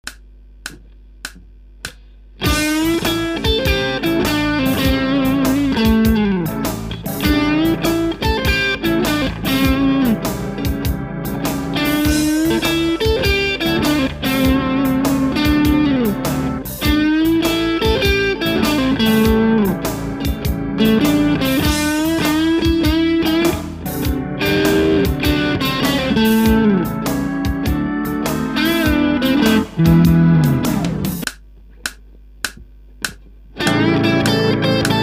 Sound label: Blues Etude